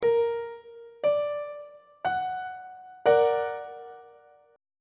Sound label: c double sharp augmented 2nd inversion on treble clef